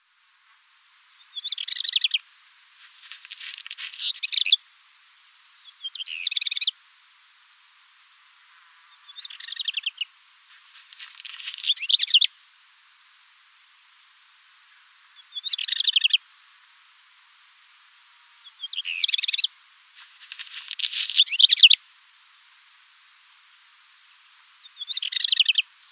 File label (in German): Hausrotschwanz Gesang; uni-kl